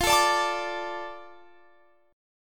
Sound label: D Minor F, guitar